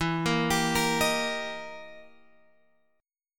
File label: E Minor Major 7th Flat 5th, E, down, Guitar, Open G